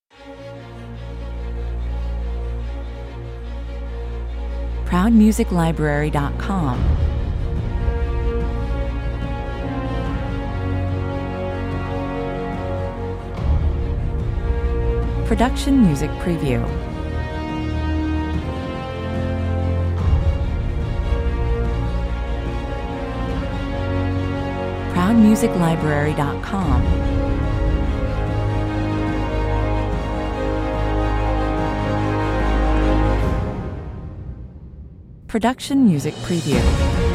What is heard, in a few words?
A spine-tingling, hair raising, epic movie score or trailer track. Full orc, no choir